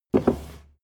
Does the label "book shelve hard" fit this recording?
Yes